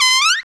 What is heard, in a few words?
Trumpets; Club-50 - Foundations Roland